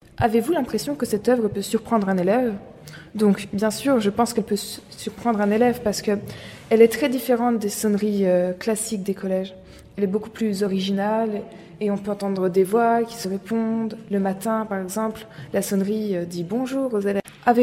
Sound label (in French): carillon